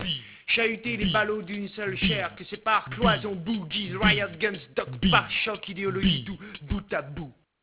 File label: beat